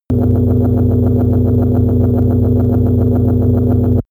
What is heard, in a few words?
shuttle engine room